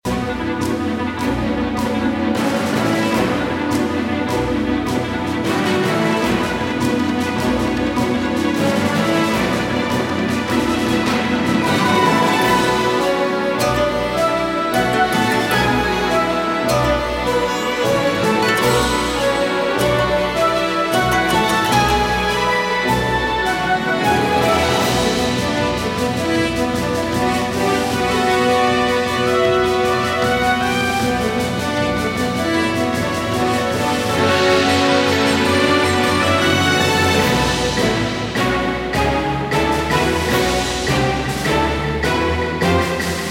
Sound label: Boss Theme Loop; Sound Tricks